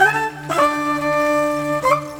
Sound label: World Lick; Sonic Foundry (Sony Creative Software); Ambient Grooves; World Licks